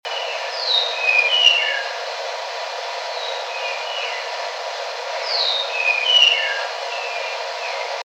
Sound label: redtailed palm thrush Kinshasa 2am